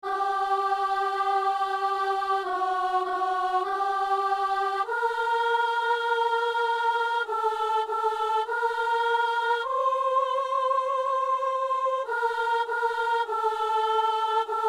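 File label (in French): anamnèse soprano; messe de san lorenzo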